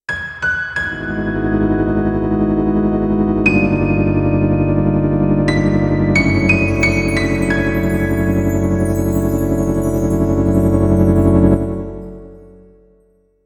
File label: BGM LAST BOSS INTRO